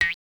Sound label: boing; Of Cabbages And Kings; pygame